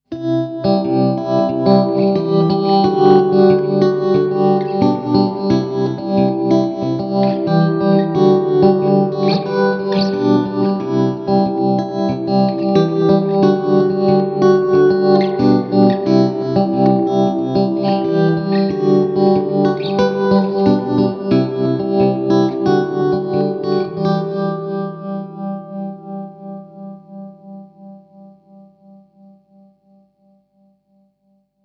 Tremlord 30 Clean

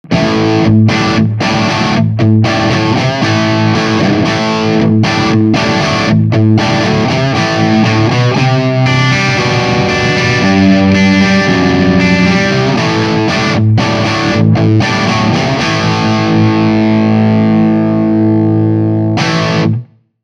Classic Rock; Bax Bangeetar

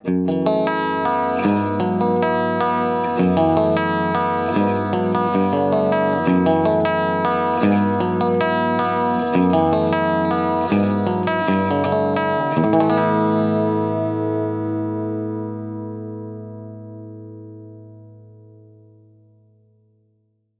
Jangle

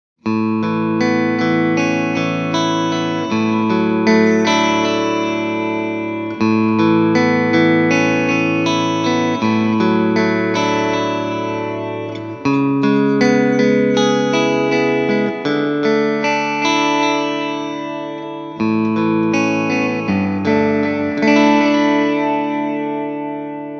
Tele Clean Mono Delay

